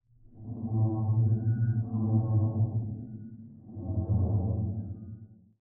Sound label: end worm idle; Barotrauma; Subsurface